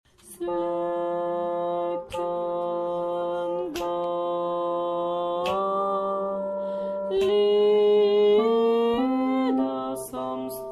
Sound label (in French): basses